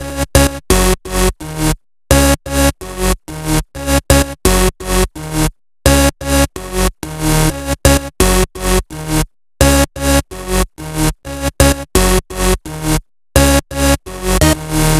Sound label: 128BPM Melody; Vengeance Samples; Rocketeer Kit